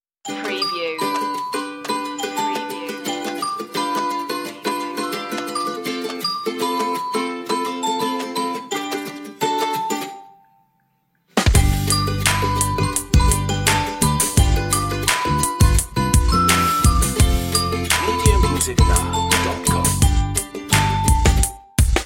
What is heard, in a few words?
no whistle